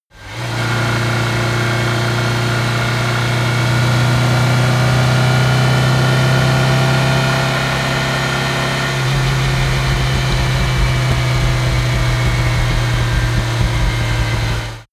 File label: car dacia slow acceleration slow down